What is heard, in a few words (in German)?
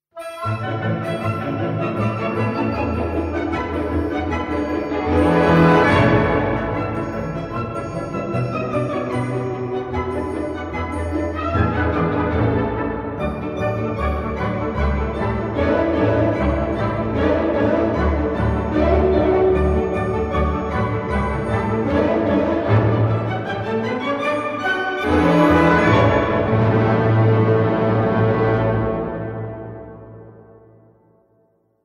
Musik; bauphysik